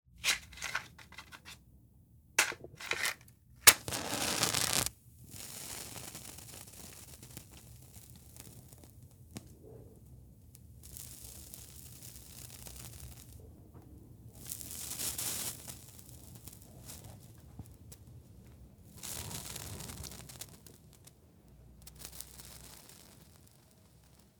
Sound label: Cigarette Smoking